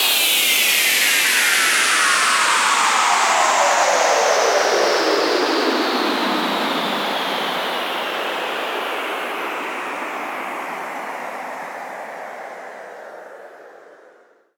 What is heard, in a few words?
Sweeps Down; Effects; Vengeance; Essential Clubsounds Vol.4